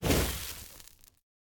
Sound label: Burnt; boomerang fu